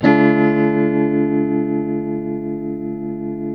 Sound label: TELTMDMIN D#, TELE CHORDS, Guitars; Spectrasonics; Hans Zimmer Guitars VOL-1